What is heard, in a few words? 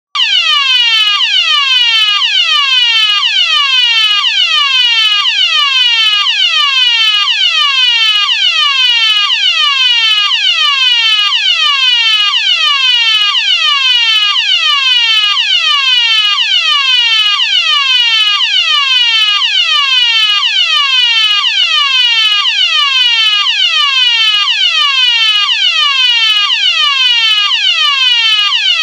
swept 1 2khz 400hz, sirene; DP3000